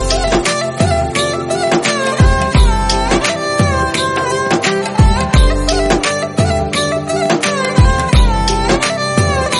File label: Bgm, ringtone